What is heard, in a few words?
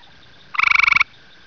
northern gray treefrog call; Texas; amphibian watch; texas nature trackers